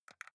console controller xbox arrow key press fast

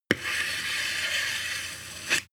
sword scabbard draw slow

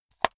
watch case small close hard

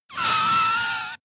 air pressure release long

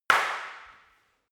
staff wood fighting single hard

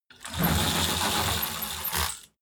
roll-down curtain roll up

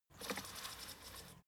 fader plastic stutter soft short

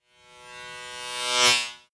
servo small whoosh

